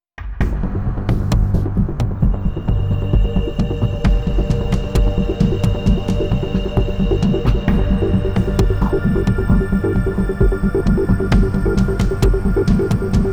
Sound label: Ticket Seaside Startup; Global Agenda